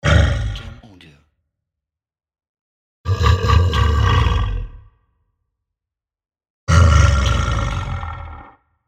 Growling Monster

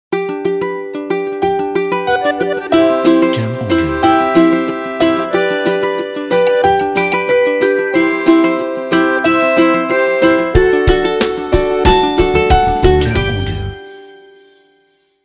Upbeat Ukulele Logo

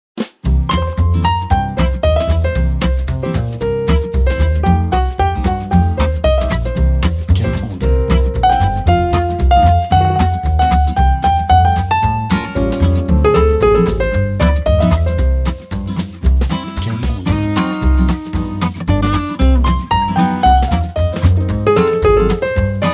Jazz Swing

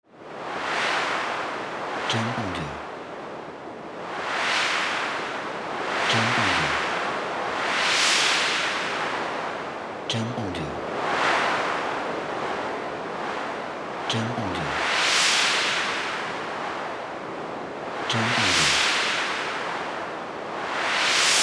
Wind Storm

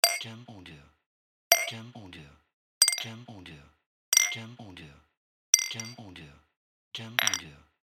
Knocking Bottles

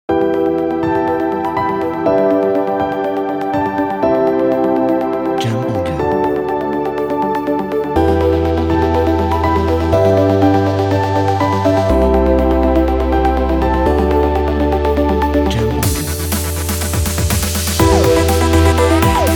Inspiring Vlog

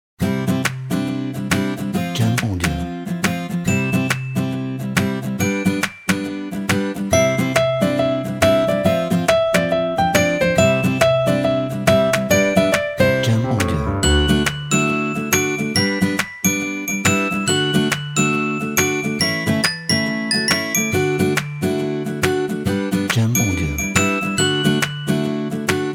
Happy Corporate

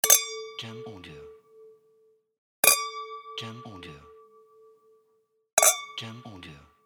Glass Bottles Clinking

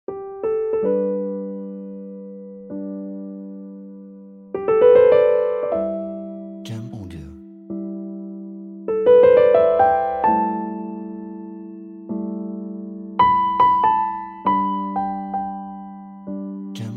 Peaceful Piano Sentimental

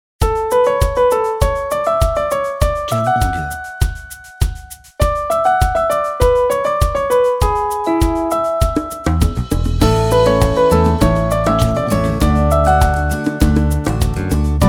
Happiness Intro

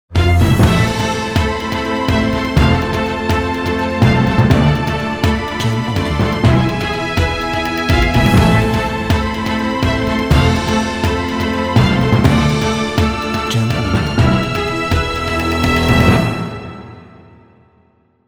Cinematic Trailer Intro

